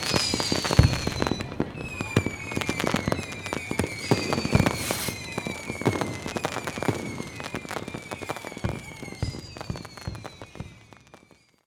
fade, firework; CosmicRage

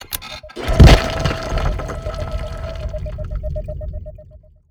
release, volcano harvesting

